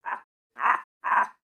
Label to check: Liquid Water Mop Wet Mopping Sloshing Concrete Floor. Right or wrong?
Wrong